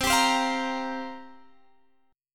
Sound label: C 7th Suspended 4th; C7sus4; strummed; 20,22,22,22,23,x